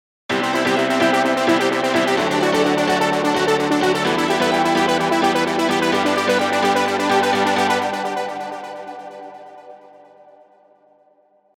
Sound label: Piano arp